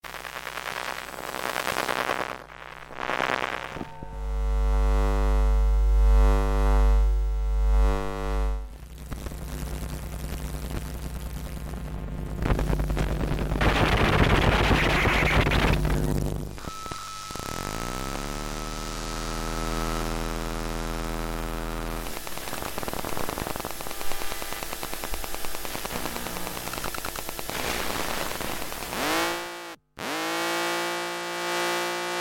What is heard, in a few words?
ElectroMagnetic Field And Emissions; Fox Audio Post Production